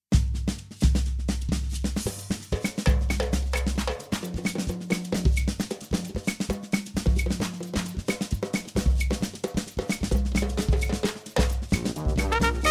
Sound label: Brass band